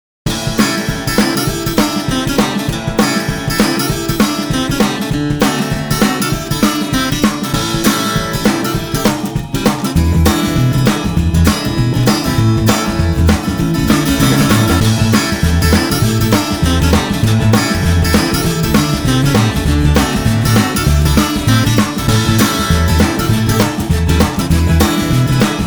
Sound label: Zydeco Celebration